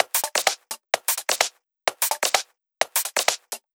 Loop, 128 BPM

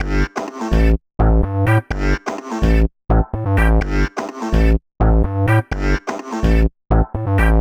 126 G COMBI GROOVE, funky; Loopmasters; Funky Underground Vol 2; COMBI GROOVES

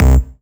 Bass Shot C

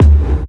Kick

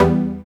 TECHNO B2; Jack Sparrow Deep Dubstep Progressions

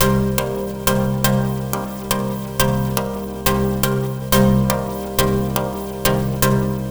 Bpm139 Drained Rhythmic Pad C, Dubstep; FX Loops

